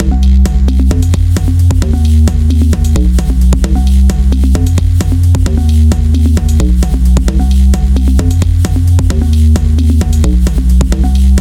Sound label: Deep Ocean Soulful Mix